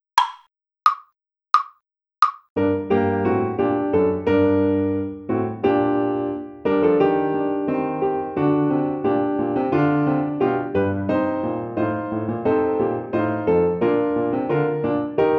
Allemande accomp; flutes grade 5

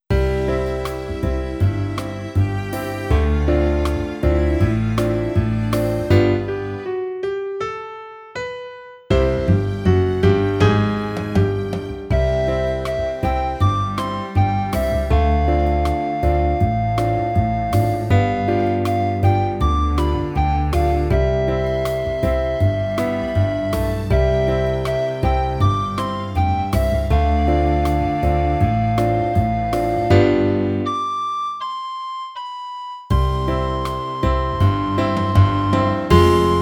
Tango Practice; razzamajazz recorder

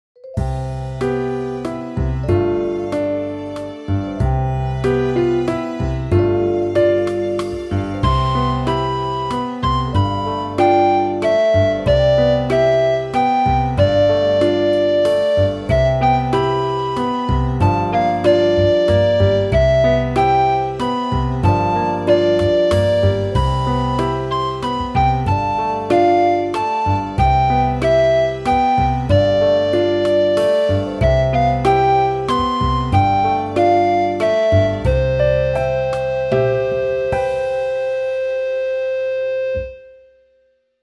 Waltz; razzamajazz recorder

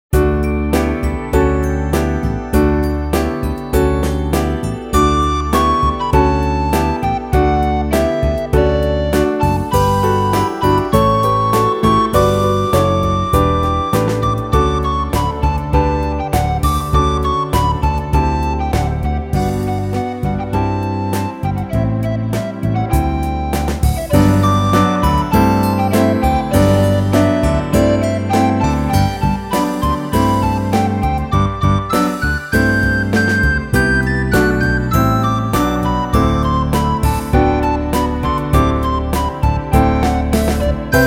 Swingtime Stroll, recorder; Razzamajazz Recorder

